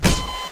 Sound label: alarm